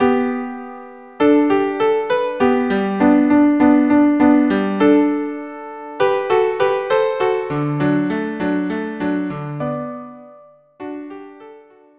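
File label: viola duo andante; KV 487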